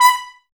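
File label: brass trumpets B; Miroslav Vitous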